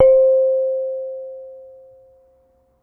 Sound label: Kenong, Gamelan